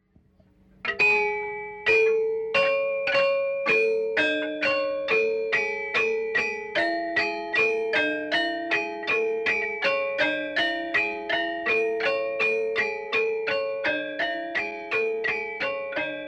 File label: gambang; Asak